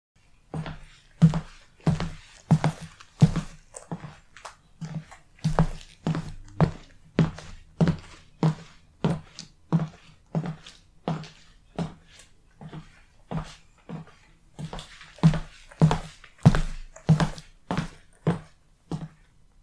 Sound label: Walking With Boots On Hardwood